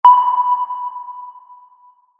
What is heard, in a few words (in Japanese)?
ソナー 探信音 モノラル; 武器効果音